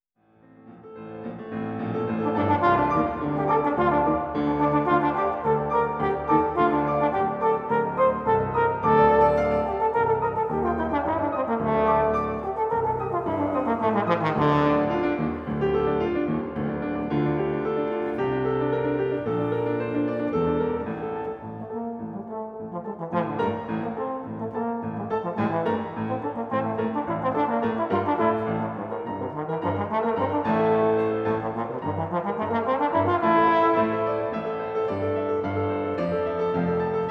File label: Concertpiece for Trombone, trombone and piano